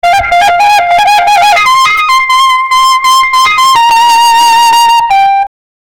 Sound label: fast clown music, circus